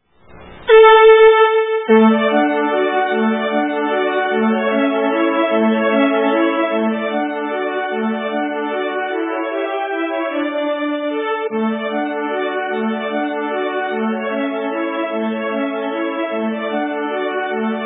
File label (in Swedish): Toarpsvalsen